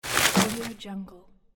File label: garbage throw